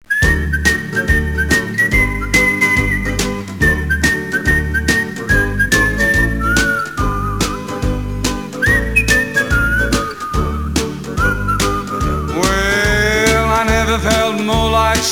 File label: singing the blues